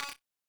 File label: Hi Perc, Data Mosh Hits; Hats - Shakers - Ride - Cymbal; 99Sounds; Mangling Audio